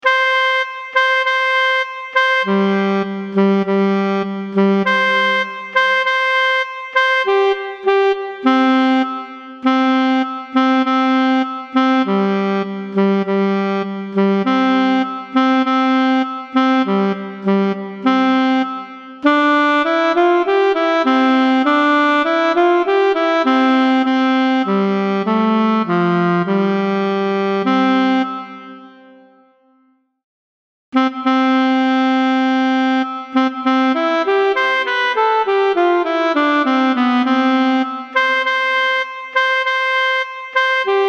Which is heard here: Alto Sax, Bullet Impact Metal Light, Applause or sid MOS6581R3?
Alto Sax